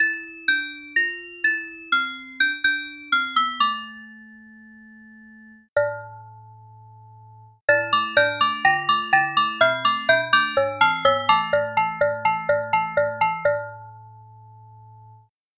glockenspiel

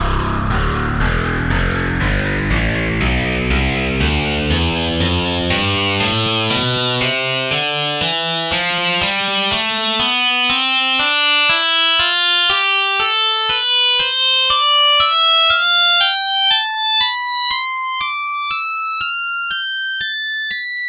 distortion guitar tonleiter

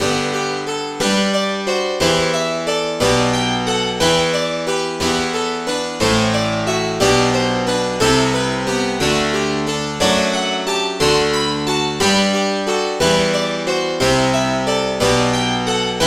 harpsichord; SpectMorph Evaluation #4

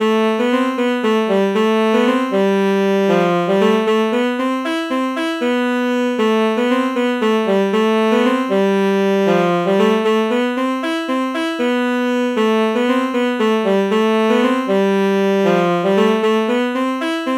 alto saxophone alto saxophone melody morph low

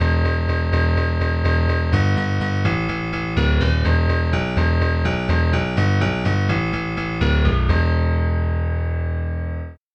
yamaha grand piano bass; SpectMorph Evaluation #4

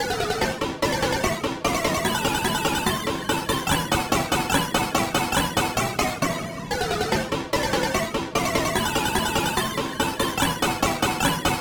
timpani

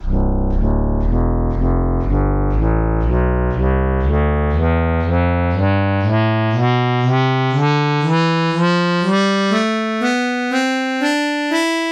harmonica tonleiter

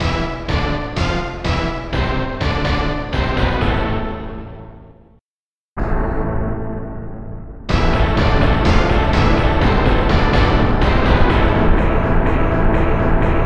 orchestra hit

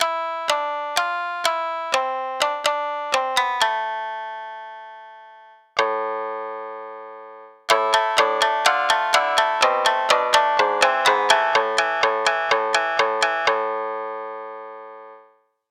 shamisen

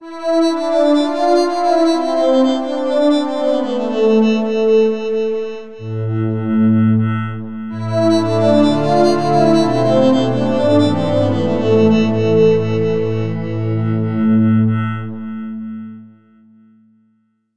metal pad

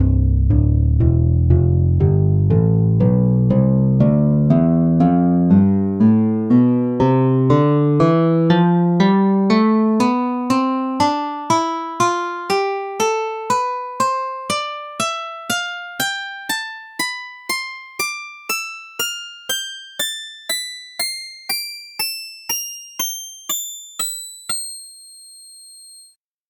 nylon string guitar tonleiter

